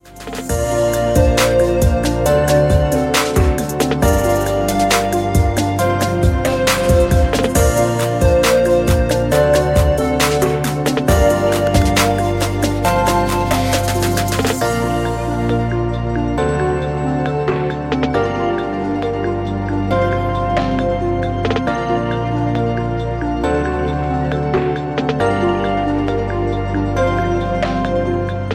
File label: Relax Quiet Electronics; MIUI12